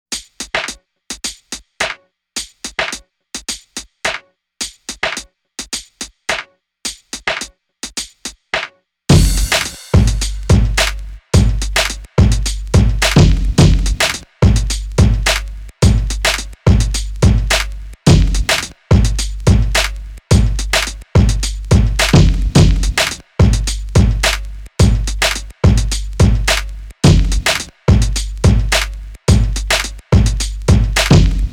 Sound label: bonus beat